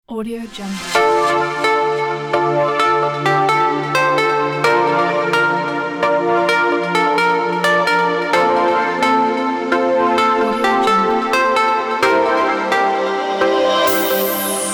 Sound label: vintage retro